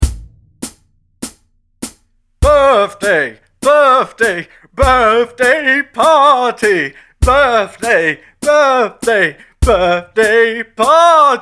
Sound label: birthday party chant